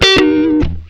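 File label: guitar chord; E-MU Producer Series Vol. 8 – Platinum Phatt; Gtr Chords&Licks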